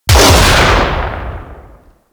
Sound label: Heavy Shotgun Shot C; Warframe; Tenno